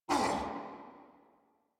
Pain Corrupted Grineer Male

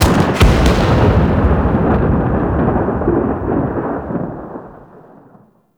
Archwing Space Explosion Close; Warframe

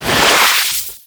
Tornado Cast; Warframe; Tengu

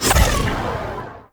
Throw, Glaive; Glaive Ghost Catcher; Warframe; Weapons

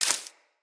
Bullet Impact Debris Grass; Weapons

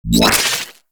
Item Drop; Sentinels; DethCube

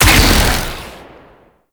Modular Secondary Barrel Fire, Weapons

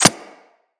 Arrow Impact; Weapons; Bullet Impacts

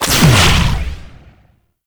Amp Charged Shot; Warframe; Weapons; Operators; Amplifiers; Op Drifter Amp

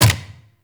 APC Turret Dry Fire; Warframe; Lasrian Turret

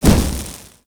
Veilbreaker Jetpack Thruster Burst C; Warframe; Vehicles

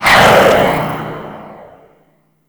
UMP Tail, Weapons

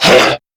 Pain Crewmen; Warframe; CorpusCrewmen; CrewmanA

